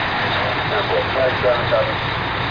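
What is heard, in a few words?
fire truck; firetrck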